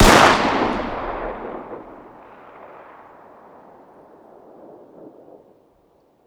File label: fire dist 9x19 pistol ext; gmod; arccw uc commons attachments